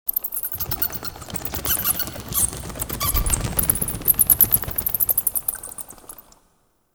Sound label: Bats; Warframe; Clan Dojo; Dagath